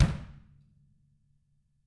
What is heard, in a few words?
TAMA, Drums